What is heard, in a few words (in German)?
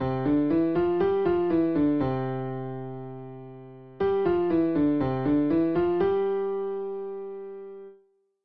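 beide Hände, klavier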